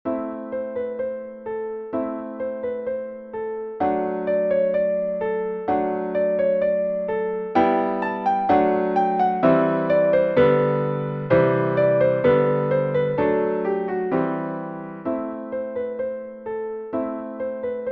Easy Piano Solo

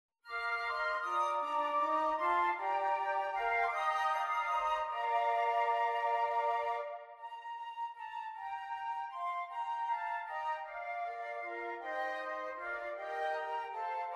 Flute Quartet; Good King Wenceslas

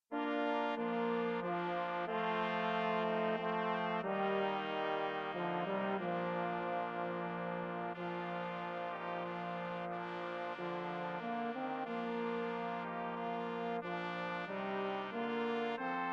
brass, brass quartet; carols for 4; 15 carols for brass quartet

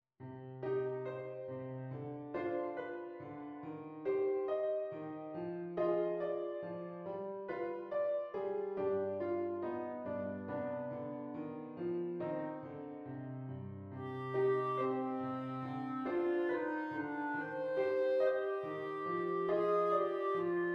Clarinet; bass clarinet

